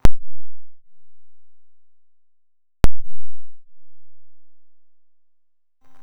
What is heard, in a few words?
cancel